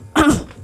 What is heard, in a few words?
fall; angelyss